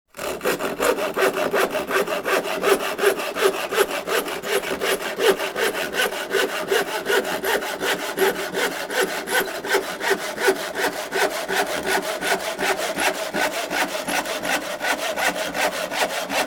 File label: hand saw